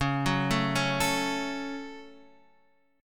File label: C sharp Suspended 4th Sharp 5th C sharp, chord